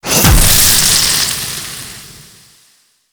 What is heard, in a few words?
Spit Attack Impact; Warframe; The New War; Archon; Nira; Abilities